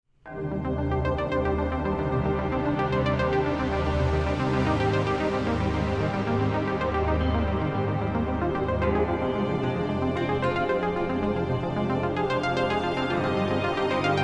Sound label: Solfeggio